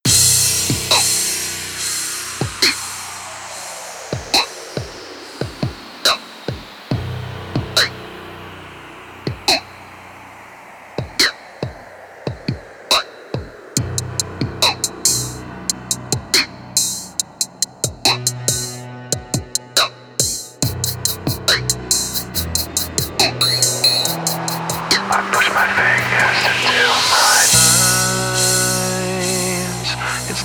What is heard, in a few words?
dubstep remix